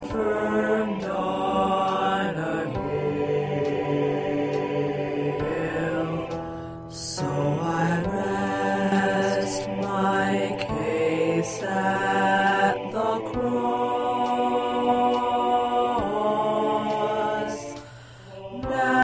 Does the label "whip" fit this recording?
No